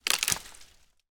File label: foley tree branch rotten twist break; sound effects one